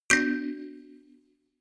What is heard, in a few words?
notification kalimba rattle triad minor low